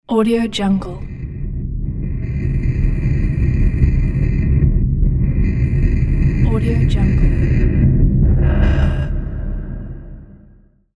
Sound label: riser